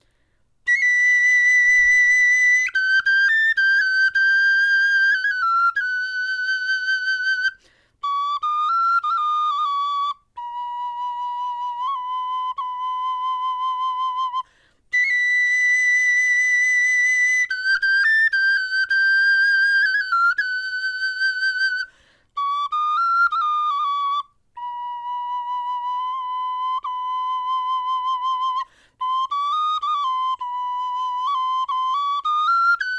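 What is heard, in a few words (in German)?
melodie, Knochenflöten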